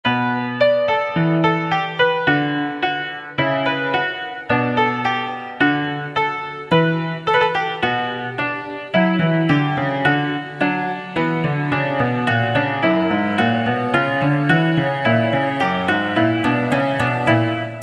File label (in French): baroquineries